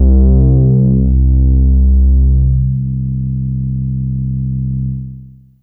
MB Wow C2, Analog; Fairlight CMI IIe

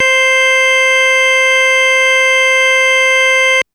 Organ Synth c5; TrashLibrary

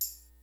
GREASY KIT, DRUMS; Kid Nepro; Elite

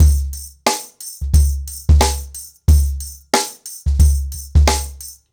Nuclear 90BPM, ACOUSTIC DRUMS

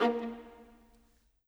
Viola, Orchestral; Sonic Foundry (Sony Creative Software); Orchestral VOL-2

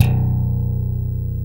BRITE BS B1, FINGER BS

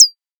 Borg Perc, drum kit; Polyend; Stazma & Concrete Collage; Concrete-Dual Borg

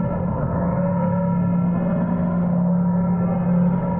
Tonal Re Draw, sparse soundscape

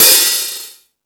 High Hat, Drum Machines

